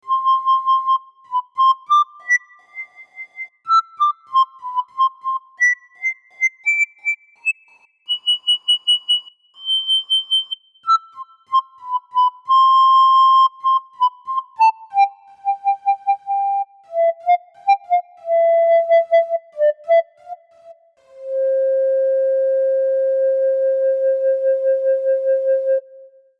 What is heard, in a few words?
high whistle pipes